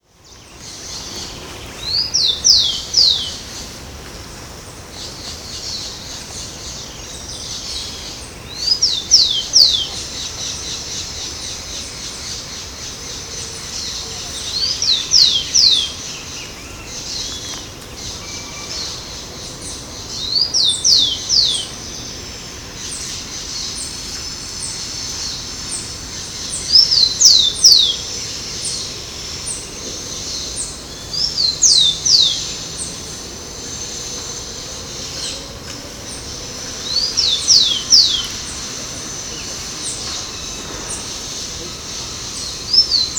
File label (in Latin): Zonotrichia capensis; Ibirapuera; SP; Silveira